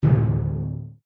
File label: fire drum; Adventure Time - Elemental; ThrillGames